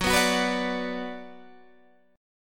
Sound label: Gb Suspended 4th Sharp 5th, Guitar, Standard; strummed; 14,14,16,16,15,14